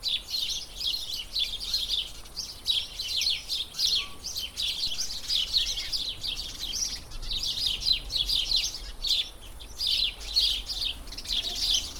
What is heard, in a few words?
birds sparrows sound